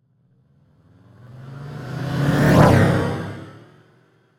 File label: Pass By Slow; 慢速驶过